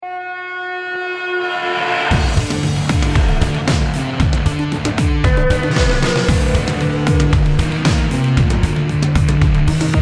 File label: start action, music